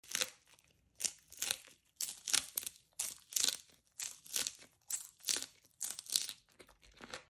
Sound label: eating lettuce